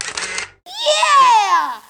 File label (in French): clic photo; MOBICLIC 14; europe